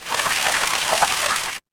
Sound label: water stream